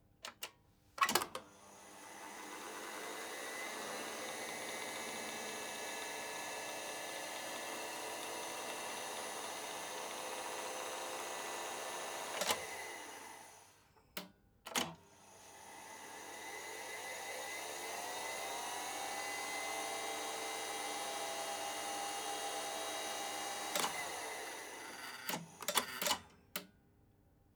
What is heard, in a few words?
Studer A80 lock position automatisation mode lock button MS stereo, Master recorder analog tape machine; Ivo Vicic